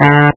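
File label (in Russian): saksofon odinochnyi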